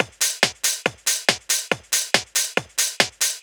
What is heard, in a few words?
Addon Loop, Drum Loops 140bpm, Perc Loops